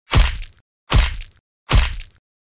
Blade Stab Or Slash Flesh Sounds

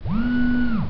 Door open

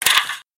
Piece Of Paper Falling Down

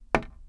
Wood Hit

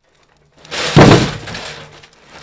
Metal Crash

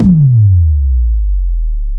Cinema Sub Bass

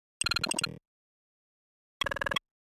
Videogame sounds

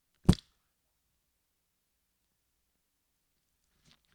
Tomato Squish

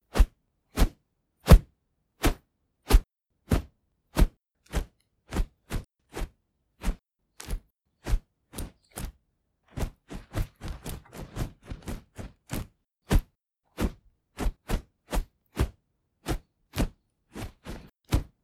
Hits Fight Dodge

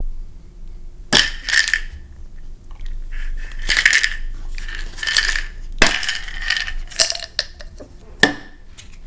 Pills

